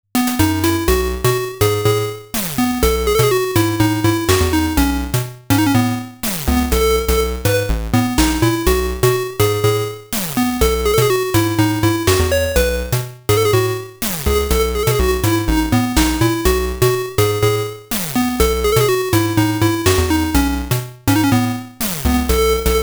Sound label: 8bit